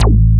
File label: RV BASS